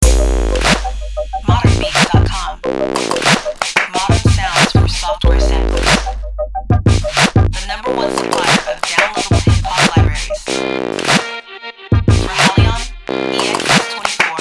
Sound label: klub klapz